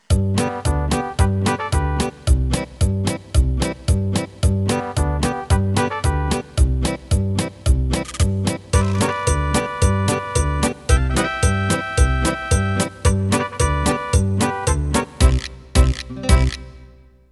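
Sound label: Mariachi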